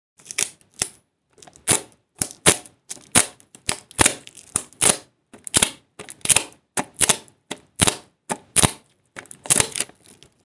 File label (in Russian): zvuk skotcha